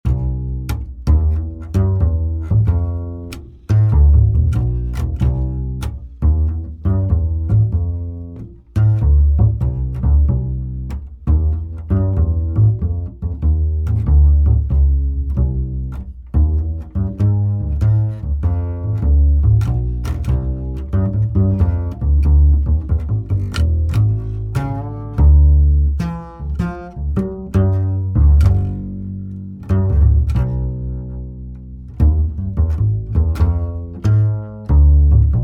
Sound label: Bass